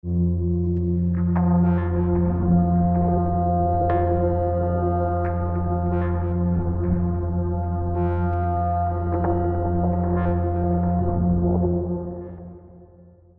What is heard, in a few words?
Guitars Pads Talking Grains; Scoring Guitars 2